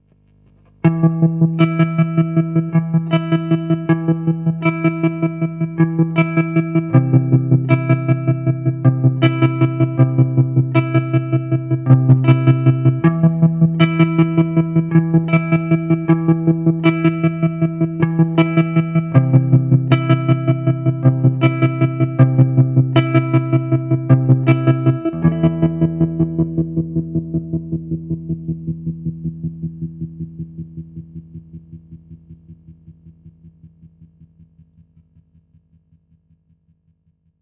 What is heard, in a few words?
Tremarella Bass-dance, optical tremolo